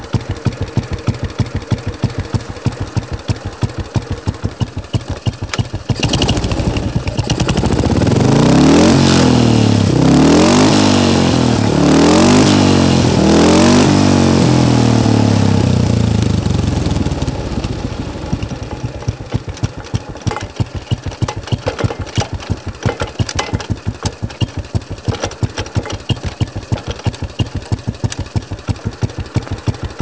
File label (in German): R34-4 odeon8 4 gaenge